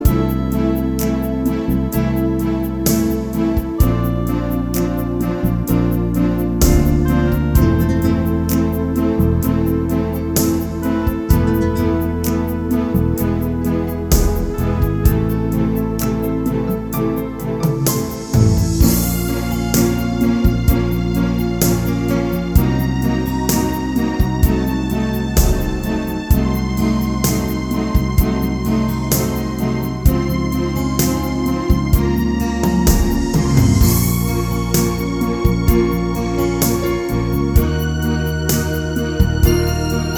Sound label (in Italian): adagio